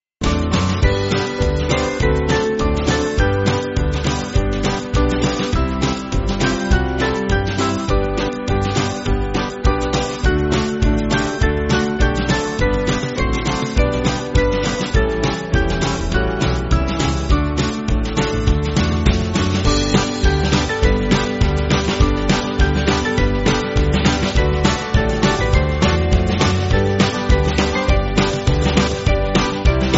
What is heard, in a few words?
Band